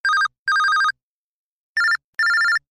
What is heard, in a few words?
Tone